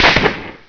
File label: RIP GUN